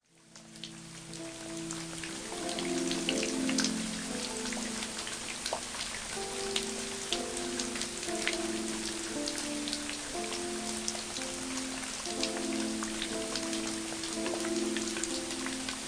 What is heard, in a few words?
Relaxing Music Meditation Music